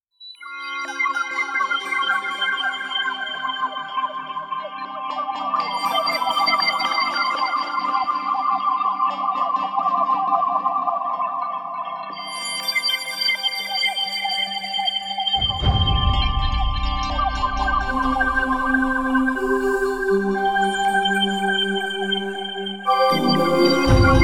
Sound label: Siren